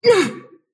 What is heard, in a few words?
sylph pain; dota; dark willow